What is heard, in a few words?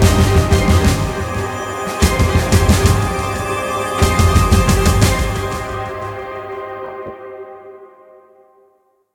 5 Seconds Left Music; Fortnite Save the World